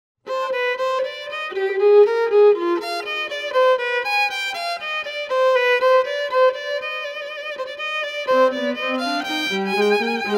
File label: Violin PU; Bach